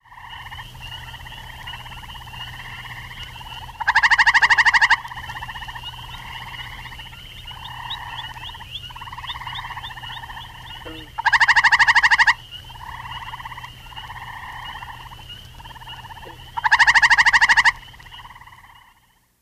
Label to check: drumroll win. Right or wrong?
Wrong